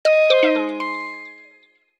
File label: Metal Twist alert; Motorola MT917 RAZR-R